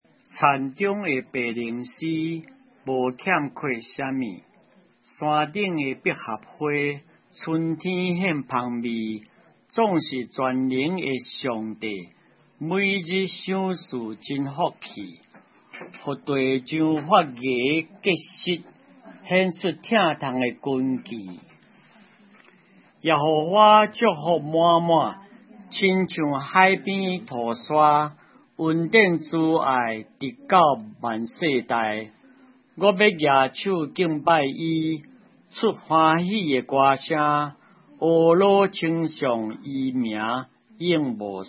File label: Man Voice